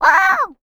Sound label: Vocal, R&B & Dance, FEM. HUMAN; ILIO; Vocal Planet VOL-4